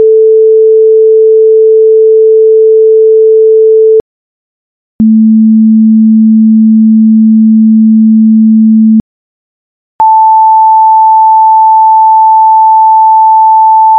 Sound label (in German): tonhoehenvergleich